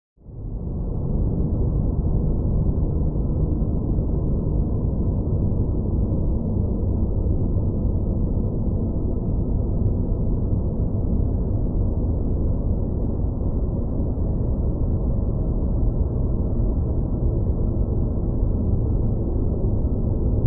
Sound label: Ambience Alien Hive, drones